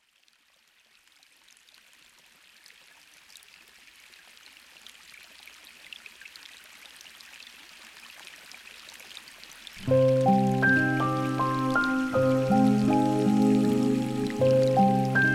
Cool Forest Rain

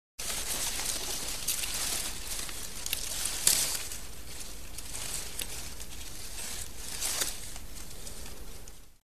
Leaves Light Rustle; Hollywood Edge Foley Sound Library

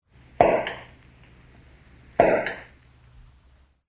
Champagne Cork Pops; SOUND EFFECTS1

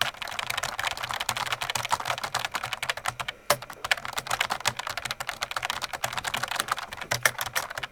KEY TYPE, KEYBOARD TYPING; 1000fx

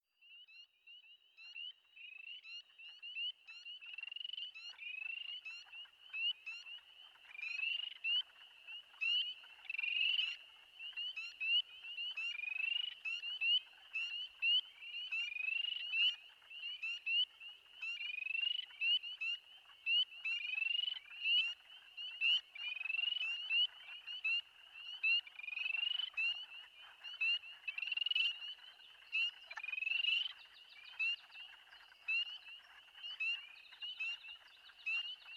Spring Peeper, Wood Frog

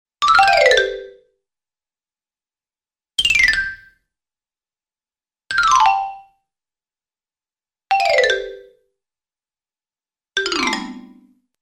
Xylophone Gliss Descending; Hollywood Edge Cartoon Trax

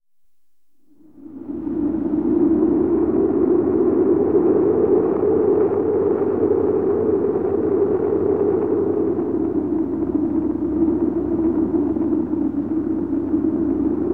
Mountain Wind Storm; Dr. Sound Effects; 210 Nature Sounds - 20 Hours of Relaxing Natural Ambiences for Meditation and Sleep

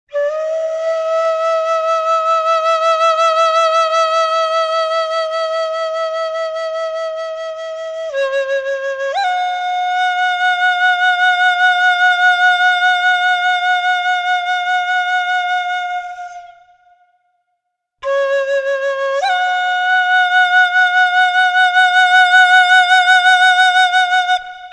Chinese Low Membrane, wind instruments; wind instruments from around the World; Andy Findon; Ancient Treasures, exploring wind instruments from around the World

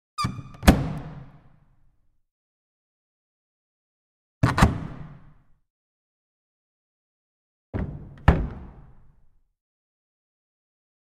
Cabinet With Slight Squeak Op; Hollywood Edge Historical Series; cd4 Castles Palaces & Monasteries

